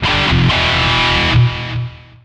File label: Pickup Guitar Riff; SFX Kit; Pickups